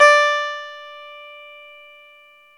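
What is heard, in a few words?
E 12 String guitar; Club-50 - Foundations Roland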